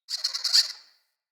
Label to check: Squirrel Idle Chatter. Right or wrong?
Right